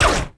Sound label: rico repeater Cannon; impacts explos